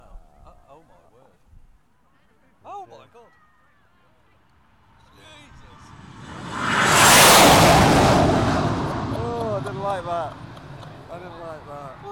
Buzzed By The Red Arrows

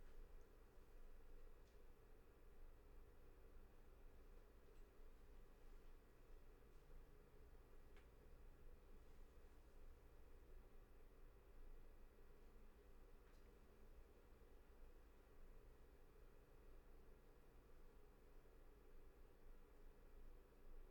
Hardware Store Roomtone

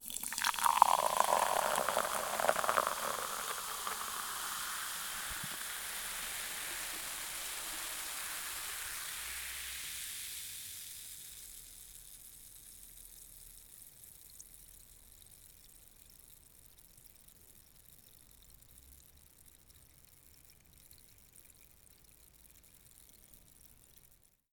Fizzy Drink Pouring Into Glass

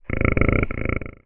Wild Pig

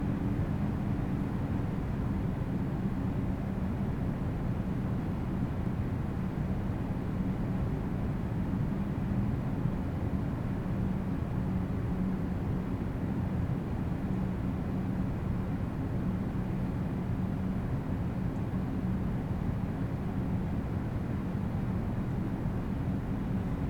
Room Tone Bathroom Windy Vent

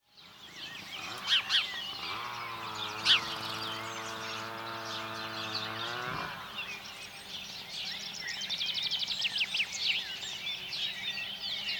Farm Ambiance

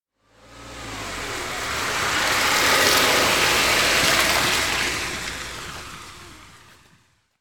Slow Car Drive By In Wet Sleet

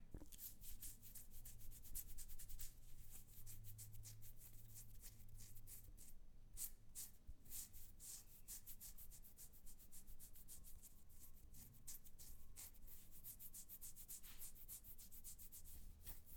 Body Scratching

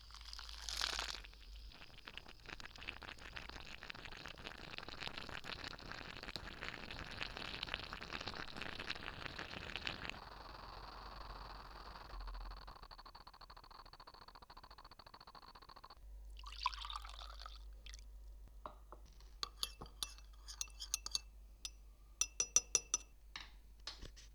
Morning Espresso

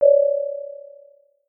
Alien Beacon

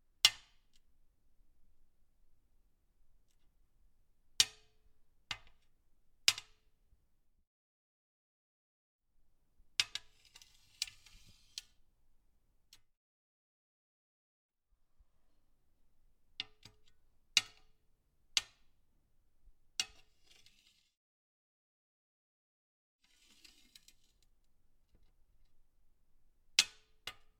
Glass Chess

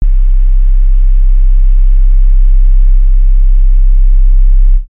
Bass

